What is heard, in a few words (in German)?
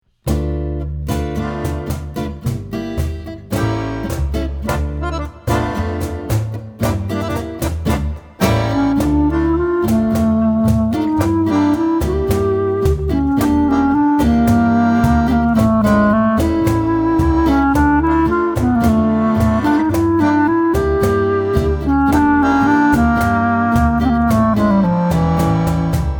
Klarinette